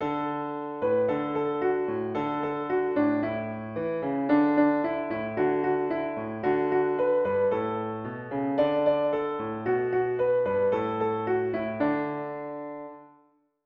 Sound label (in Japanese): ピアノ